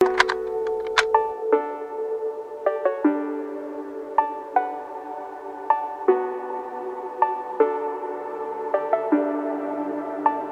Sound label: phonk dnb